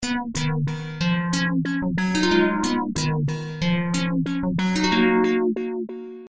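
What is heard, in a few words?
Melodious Loop Msg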